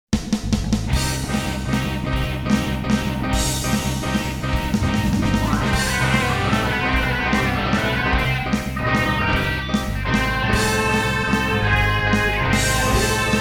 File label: Ballad